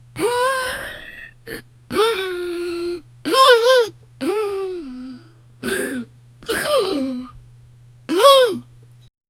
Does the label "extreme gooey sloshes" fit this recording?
Yes